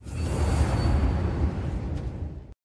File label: snap; infinity-craft